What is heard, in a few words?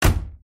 Car close